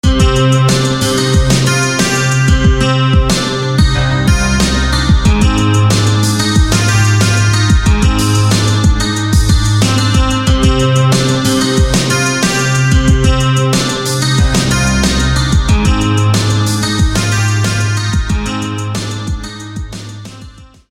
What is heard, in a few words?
Guitar; Gemini; SFB FX